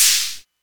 ELECT PERC; OMI - Universe of Sounds

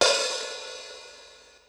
pss795 Open Haty; Polyend; Legowelt

